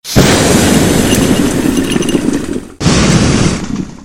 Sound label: tradeskill alchemy